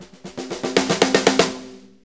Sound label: 6-8 Fill, Drum Tools